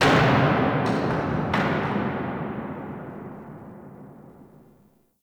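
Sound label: Ocean Distant Bang; Ambience; Warframe; Grineer Ocean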